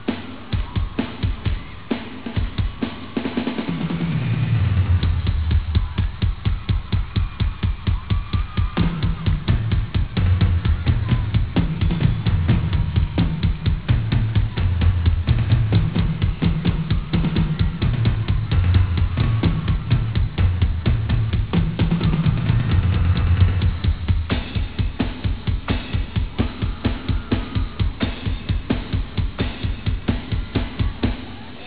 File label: Drums